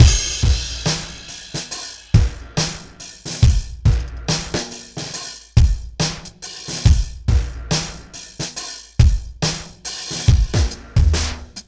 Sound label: TRIPHOP; INIS; Funky Xtreams